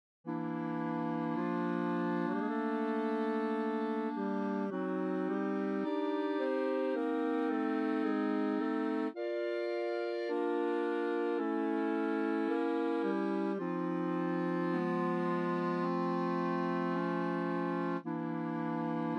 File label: Organ Interlude